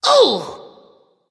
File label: ouch; smite; kukulkan